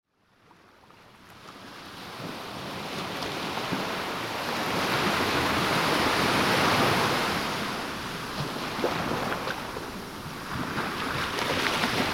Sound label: hawaiian waves; sound oasis